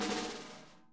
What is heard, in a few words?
SNARE BUZ; Sonic Reality; ID SNARE 3+